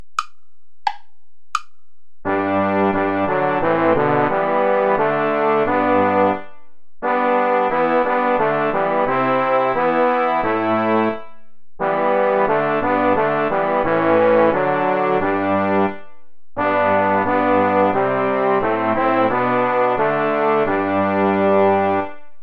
play along without trumpet; goudimel claude; sus serviteurs seigneur

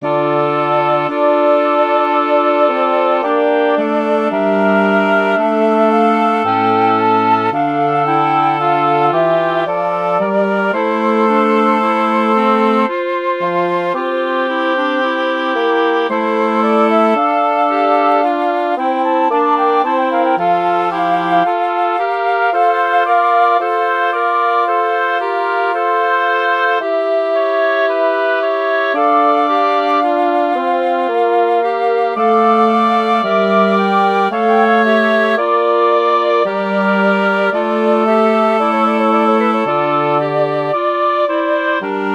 for woodwind quartet; Buxtehude, Dieterich; Puer natus in Bethlehem

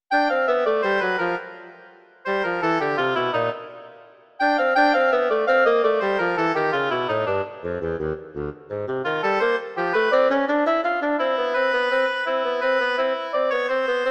for oboe and bassoon presto

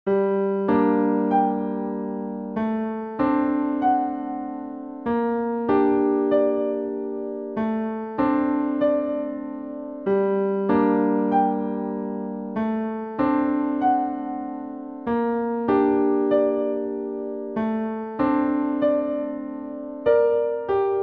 children handbells arrangments

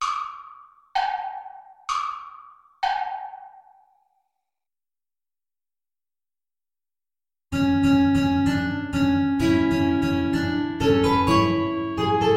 tango play along without guitar; albeniz isaac

